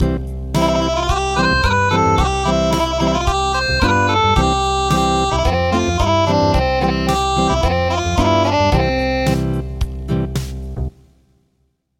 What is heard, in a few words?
Acoustic, midi traditional